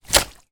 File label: wet towel drop on ground; foley; zapsplat